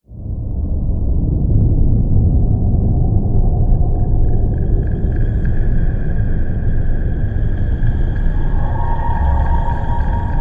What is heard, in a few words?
Thunderbird Skies Atmo